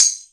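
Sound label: Tambourine, Yamaha DTXpress Percussion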